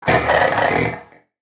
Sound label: damage, fractal guardian; Legacy